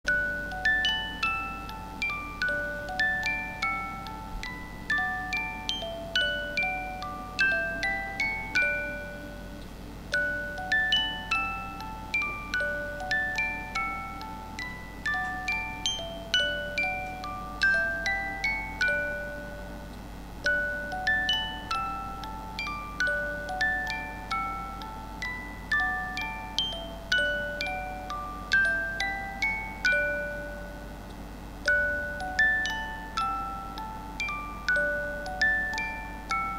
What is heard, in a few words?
Red Fox Melody